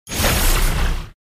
Ball Five Show

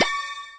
Win Icon Up

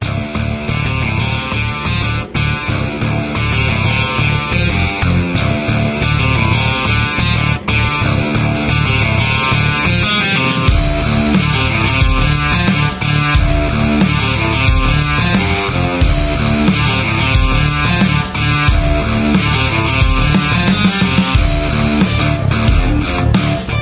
Free Mode BGM

